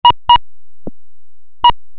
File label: cute sound